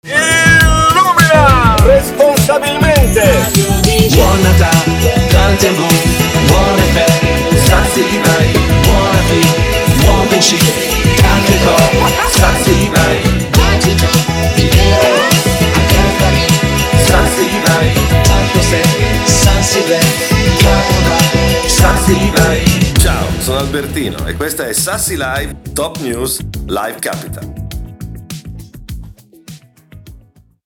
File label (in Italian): jingle; SassiLive; Albertino